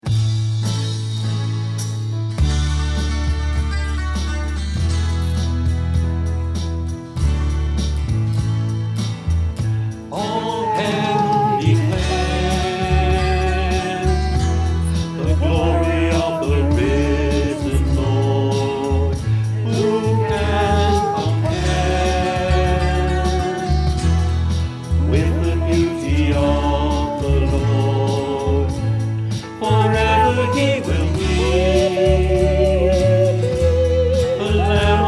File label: choir; virtual choir